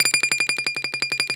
Balalaika, Prima Balalaika, Plucked Strings; E-MU Producer Series Vol. 7 – Old World Instruments

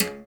STR BS, Berimbau; Roland LCDP11 Africa VOL-1

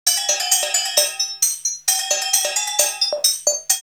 R8 132, drumloops, DrumMachines; X-Static Goldmine; 90 s Sample CDs

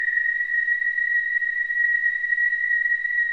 WHIS, whistling; Spectrasonics; Symphony of Voices

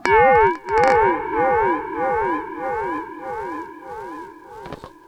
SPACEY R, Funk Guitar; Zero G - Funk Guitar

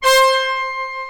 VOY8 BRASS, VOYETRA 8; Greytsounds VOL-1

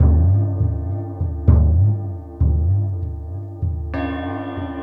Void Perc; The Void; Processed Drumkits

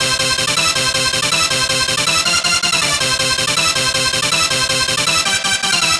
Dance Synths, Hardcore Loops, Synth Loops

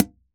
CONGAS; Q Up Arts; Denny Jaeger Private Collection

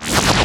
laser slew; Greytsounds; Scott Peers Sound Engineering

